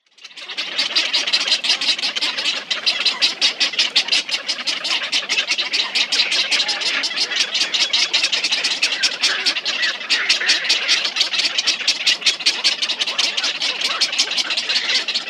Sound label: Purple Heron nestlings